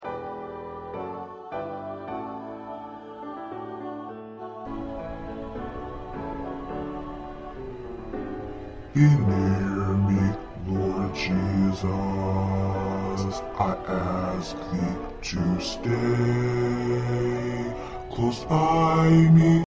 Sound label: Bass